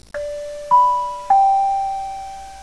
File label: Ships Dinner Bell